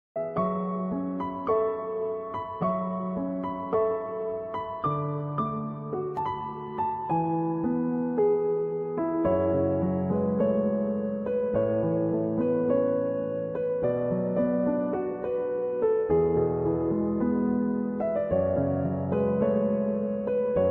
song instrumental ringtone flute ringtone love ringtone hindi ringtone